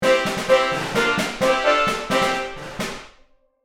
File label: Marching brass and field drum riff